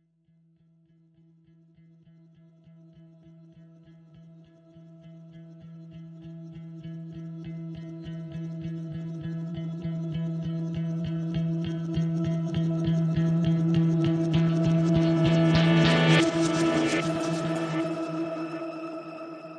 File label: Guitar FX